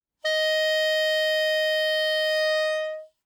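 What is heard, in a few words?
Soprano Saxophone non vibrato ff Eb5; Woodwinds; Bb Soprano Saxophone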